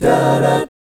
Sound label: DB13B5, GUY CHORDS, Vocal, Jazz; ILIO; Vocal Planet VOL-3; Jazz & FX